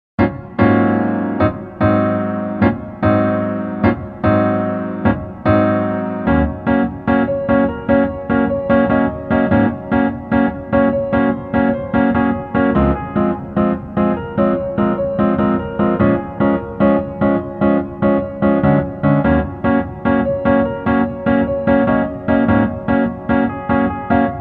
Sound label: Piano